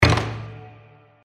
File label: hit; Triple Dragons